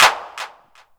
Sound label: clap, Korg ER-1; 300 Drum Machines; Kit 4